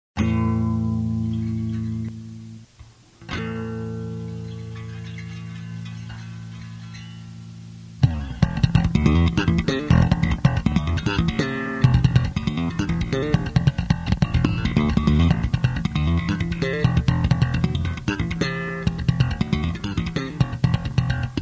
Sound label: Bass solo jam